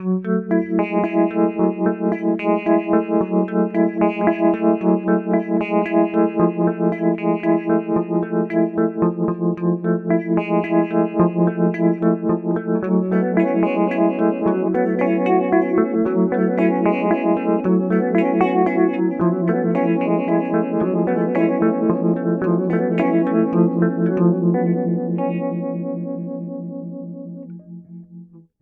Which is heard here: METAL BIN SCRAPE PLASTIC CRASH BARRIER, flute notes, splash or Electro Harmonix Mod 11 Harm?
Electro Harmonix Mod 11 Harm